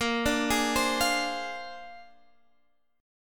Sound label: A sharp 9th A sharp Guitar Standard; arpeggiated; JGuitar